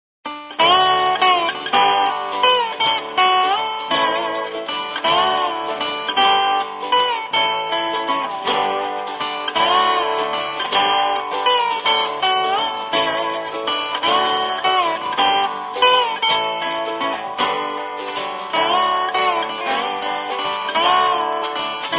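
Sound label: Music Ambient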